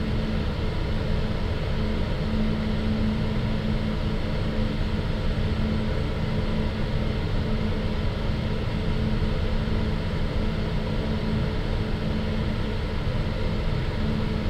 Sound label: Ventilation